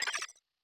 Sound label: Command Cancel; starbites